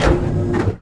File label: elevator teleport; Tony Hawk's Project 8; XBOX